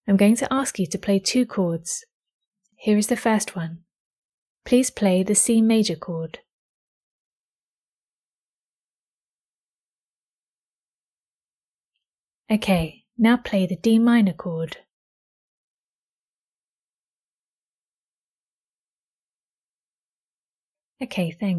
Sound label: Chords C Maj D min